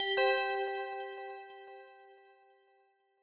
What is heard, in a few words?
win sound; base game